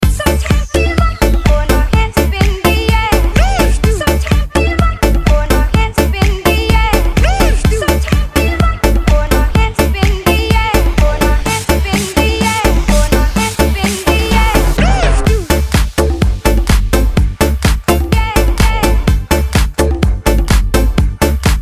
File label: House Mix